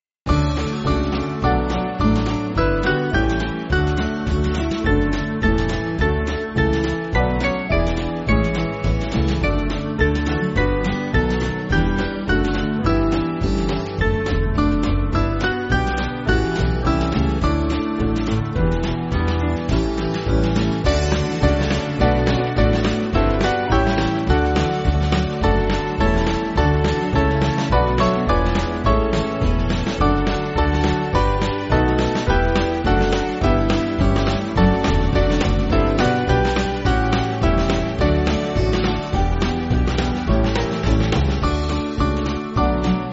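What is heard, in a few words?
Band, Music Backing Files for Churches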